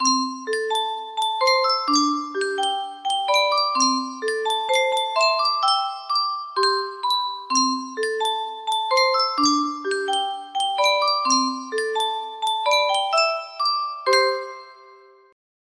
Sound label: yunsheng music box traunstein melody